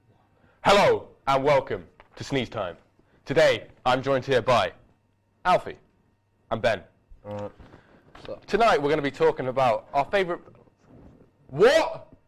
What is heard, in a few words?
Studio Recordings